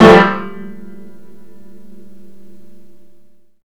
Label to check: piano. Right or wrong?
Right